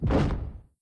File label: step; sven; bull